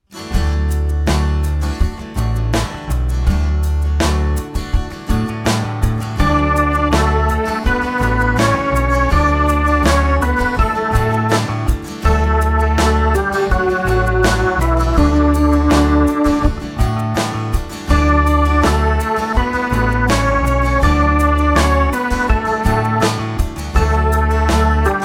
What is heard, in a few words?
melodic dictation